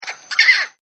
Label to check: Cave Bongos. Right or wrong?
Wrong